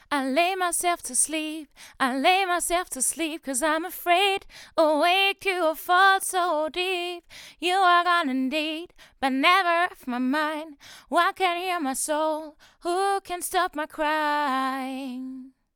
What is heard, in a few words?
vocals fame c03